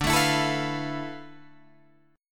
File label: D Minor 6th Add 9th D, Guitar, Open D